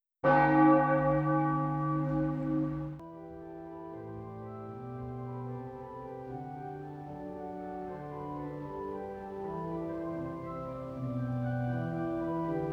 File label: church soundscape